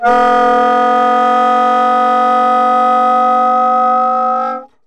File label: sax tenor multi; OM-SoX hoaencode